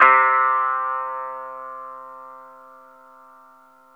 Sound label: BASS, C3; Zero-G; Chemical Beats; BASSES